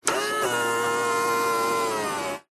vehicles car suv nissan patrol auto wing mirror fold in motor whirr